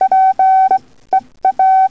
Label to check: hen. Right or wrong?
Wrong